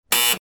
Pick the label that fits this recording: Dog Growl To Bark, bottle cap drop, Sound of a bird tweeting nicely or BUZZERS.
BUZZERS